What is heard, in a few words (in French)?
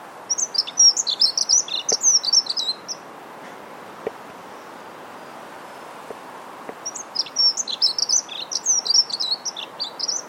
accenteur mouchet